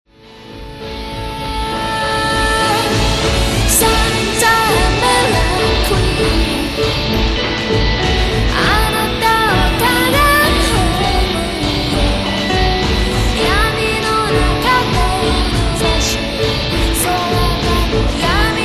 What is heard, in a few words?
music